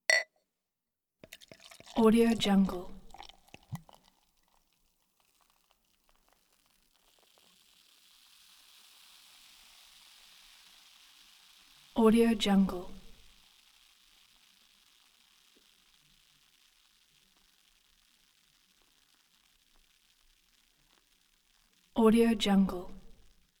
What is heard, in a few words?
beer pour into glass; l schoeps r neumann